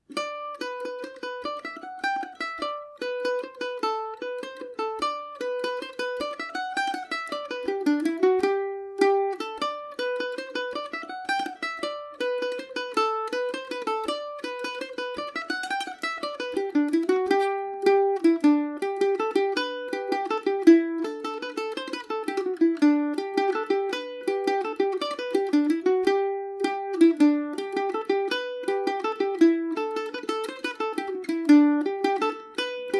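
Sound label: cavehill g major, mandolin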